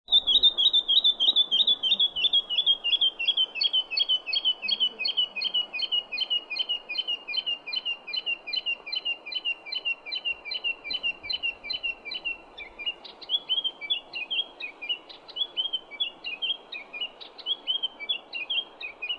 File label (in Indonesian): suara remetuk bakau